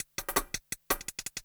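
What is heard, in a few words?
loop intro, 167 BPM; Ueberschall; Drum & Bass Resonance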